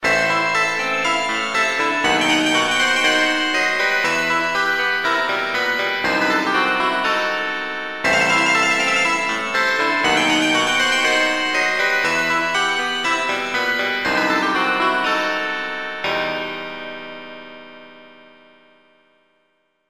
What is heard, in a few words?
Game Over Music; Last Dream